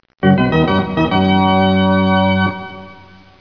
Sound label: Tadadadadada; Revelation Sight and Sound SK